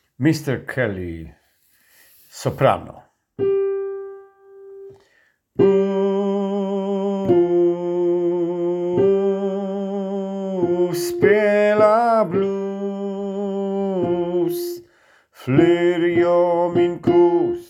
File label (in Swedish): sopran